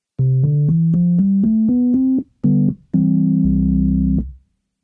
Vintage Vibe Tine Bass

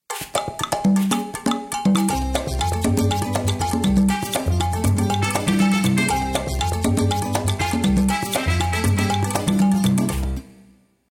Melodic Ensemble

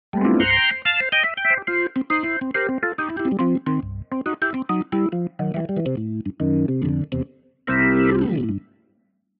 Tonewheel Organ C3

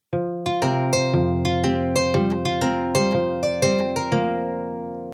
Kora

